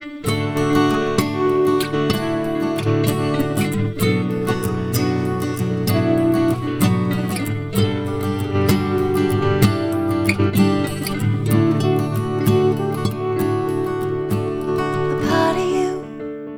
Seed Noise backing

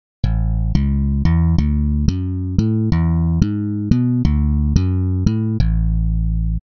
melody, bass synthesis

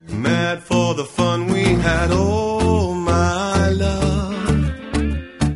Music, Auralization

